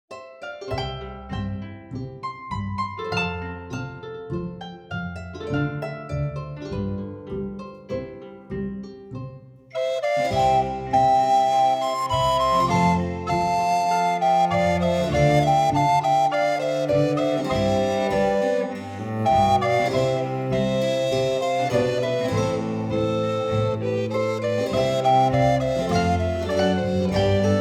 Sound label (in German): Weihnachtsweise